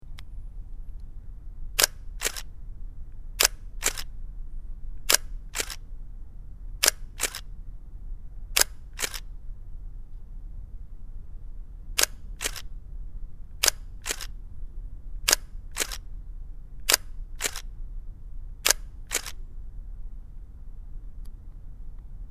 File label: quiet